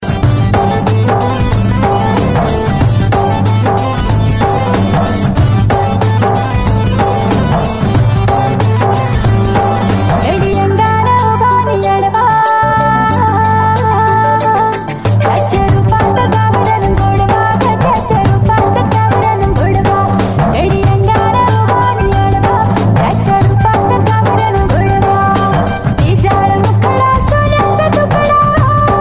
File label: marathi tone